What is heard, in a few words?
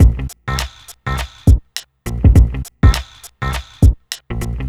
HipHop 70'S Crime Combo C maj; Digidesign; Pro Tools Essential Loops